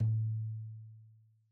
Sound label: Tom2 Stereo, Deeply Sampled Drums; SMDrum